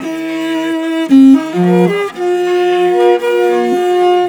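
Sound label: VIOLI LP, MORIN violins, Asian Traditions; Soundscan vol.60; AKAI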